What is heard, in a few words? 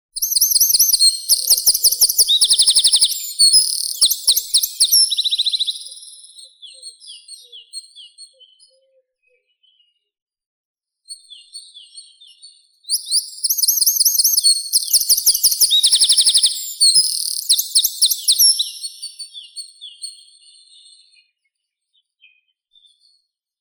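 wren, Troglodytes troglodytes; krapivnik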